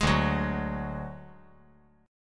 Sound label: D-Major Flat 5th, D, 5G, 4C, 5E, 5A